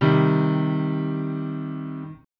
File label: Cm lower, chords